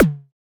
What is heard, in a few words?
Puff Kick D, Wavetable, Effects; Instruments; Ableton; Synth Essentials